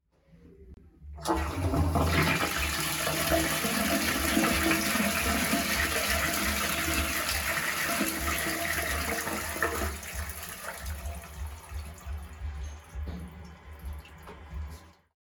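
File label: smyv tualeta, toilet flush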